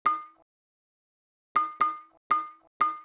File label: Vari Breaks Drum; hydrogen